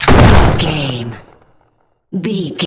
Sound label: gun shotgun shot